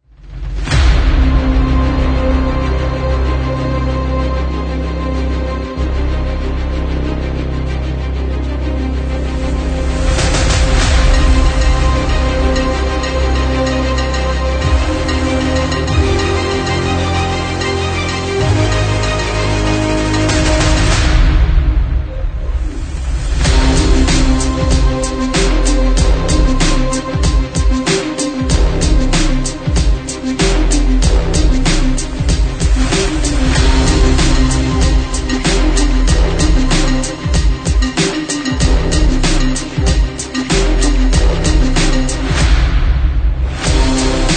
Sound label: Sport Trailer Kit; 运动预告片套件